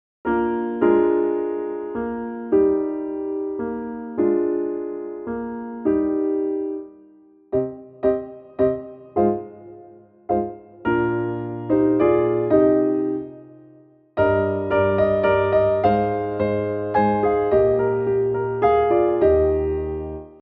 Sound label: faster tempo piano accompaniment; Bei Männern welche Liebe fühlen